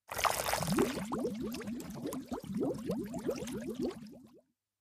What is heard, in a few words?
gambler draw; Great Book Of Magic Deluxe; Wazdan